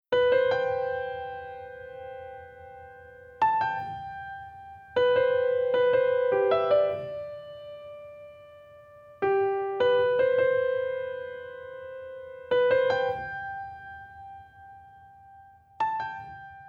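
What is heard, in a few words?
Mix Send Reverb Plate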